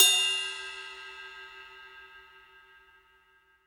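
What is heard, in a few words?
CYM RIDE, ride cymbal; Sound & Vision - Gigapack I; mono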